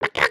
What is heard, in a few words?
chicken cluck long; emotes